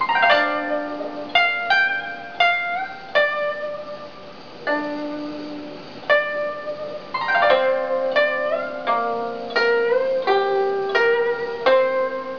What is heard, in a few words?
zheng